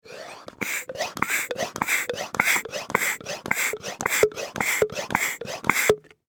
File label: Household Pesticide Pump Slow Dry; Yard Tools (Sound Effects:Tools)